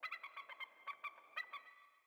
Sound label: sting eagle; Rust